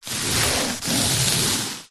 foley balloon foil small inflated rub scrape on carpet